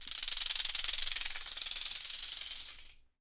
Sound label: CHILDS, TAMBOURINES; Voice Crystal - Steve Reid Definitive Percussion